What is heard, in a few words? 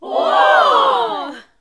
NOISE, CLASSIC VOX; Best Service; Hallelujah